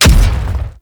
Thumper Bot Step Heavy; Warframe; Grineer; Movement; Steps

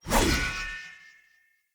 Short Sword Throw Perfect; Weapons; Player; Swords; Sollos

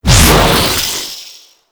Sentient Rifle Empty Fire Explosion; Warframe; New War

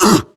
Pain; Warframe; Grineer Catbrow Handler; Battle Barks

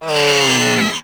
Kavat Vocal Death; Warframe; Khora

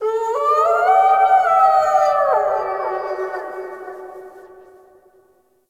Night Distant Coyote; Warframe; Eidolon Plains; Ambience

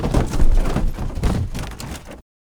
Cardboard Boxes Destroy; Warframe; Ambience; NineteenNinetyNine

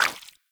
Footstep Default; Pirate; Warframe

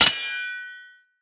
Short Sword Medium Parry Impact

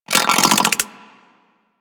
Leech Projectile Bite; Leech Osprey

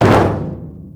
Metal Barrel Impact; Ambience; Gameplay; Warframe; Nineteen Ninety Nine

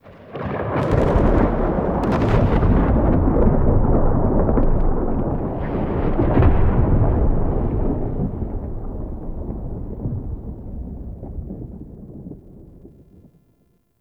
Exterior Rolling Thunder; Ambience; Warframe; Duviri; Murmur

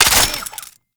Belt Fed Rifle Pre Fire C; Warframe; Tenno; Frumentarius

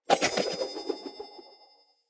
Throwing Knives Projectile; Pothia

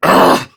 Pain Prime Grineer Male; Tau; Battle Barks

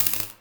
Laser Skin Printer Laser Stop; Ambience; Props; Warframe; Entrati

